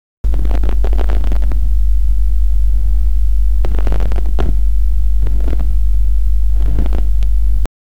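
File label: heart monitor, medical; monitor cardiaco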